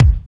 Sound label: KICK, Tekno Industrial; East West